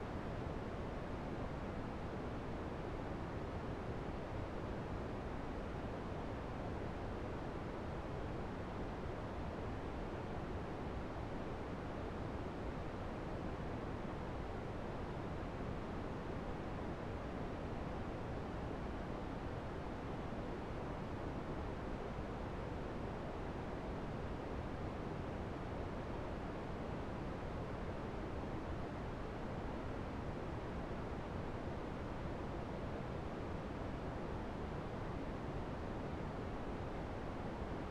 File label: water